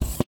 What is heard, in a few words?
gear, combine soldier; npc